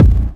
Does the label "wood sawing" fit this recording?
No